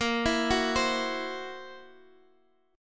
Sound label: F sharp 7th Flat 5th A sharp